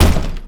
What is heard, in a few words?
close door heavy